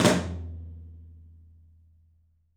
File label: 13 TOM, 3D Drums; 3D Sound